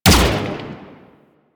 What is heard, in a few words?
Arch Dual Pistol 2D Fire; Weapons; Warframe; Archwing